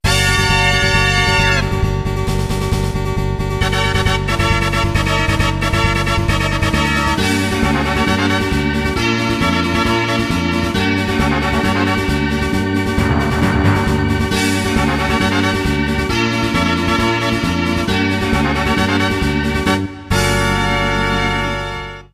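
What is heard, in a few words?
background music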